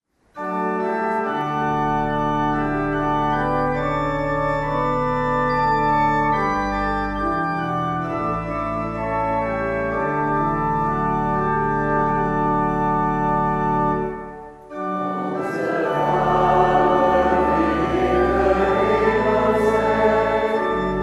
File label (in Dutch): Onze Vader